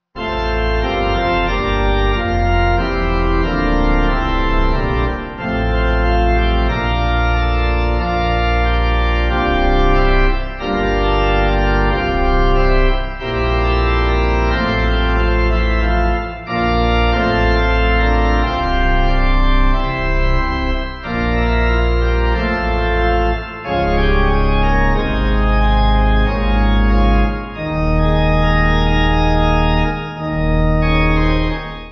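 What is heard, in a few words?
Pipe, hymn backing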